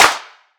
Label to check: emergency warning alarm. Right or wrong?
Wrong